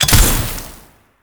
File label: Invisibility Proj Activate; Warframe; The New War; Drifter; Abilities